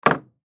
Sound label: Piano Key Cover, Piano Key Cover Close; Pianos; Musical; Blastwave